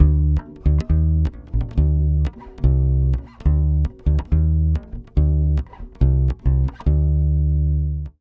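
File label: 140 bass Dm11, brazil bossa; USB Soundscan; AKAI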